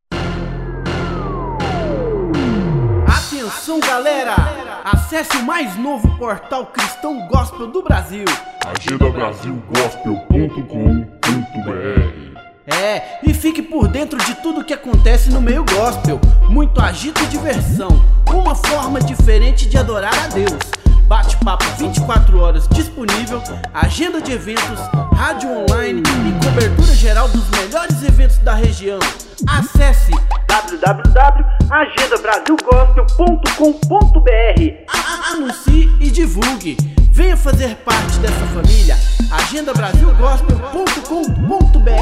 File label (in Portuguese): vinheta agenda brasil gospel p radio